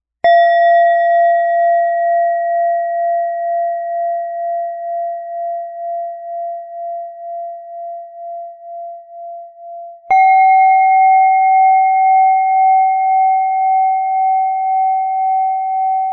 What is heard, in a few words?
Octave Triad